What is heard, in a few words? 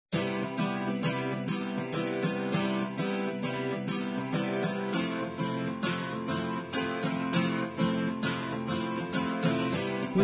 groove